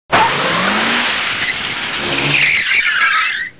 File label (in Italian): Stridore Gomme; trasporti; auto